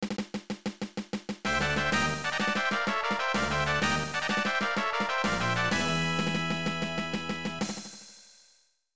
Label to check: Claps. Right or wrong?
Wrong